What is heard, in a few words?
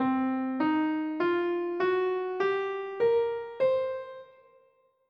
BLUE SCALE; pblue